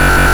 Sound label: Buzz; Donkey Kong